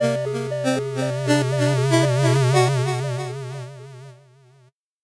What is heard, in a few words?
8bit jingle normal